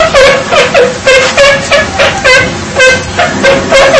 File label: piping, ElephantCorner; elephant sounds